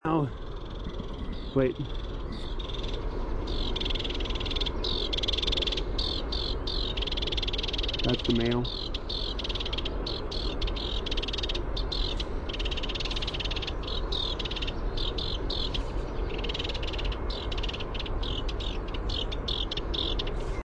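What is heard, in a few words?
House Wren call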